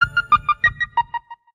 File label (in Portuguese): efeito radio; radio globo